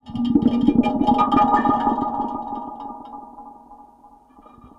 Moog LPF & Delay, contact mic, Tin Of Guitar Strings; musicradar; contact mic madness